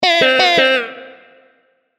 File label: correct; チキンのおもちゃでピンポン。その２; 正解の音・ピンポーン